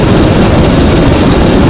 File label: giant pyro flamethrower loop; Garry's Mod; tf2; mvm